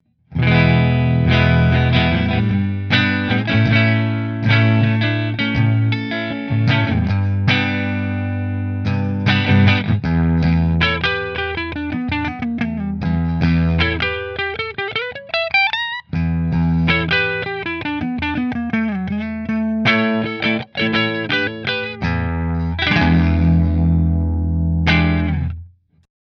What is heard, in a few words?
Heritage Neck Fender, guitars